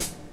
Real Closed Hats

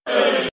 ay group of men, voice ooh ahh

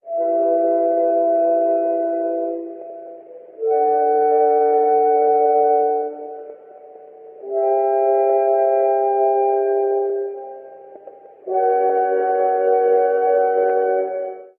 strings, sad

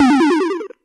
deflection; Zaps and Beeps

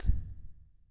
psst

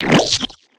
squeeze spurt

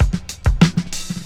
Boombap drum loop

